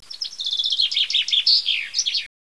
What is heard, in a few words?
birds env